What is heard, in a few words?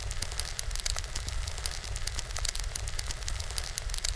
desert; smartpandademo